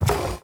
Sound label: wood pickup; ui; inventory